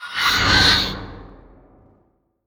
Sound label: Raven Watcher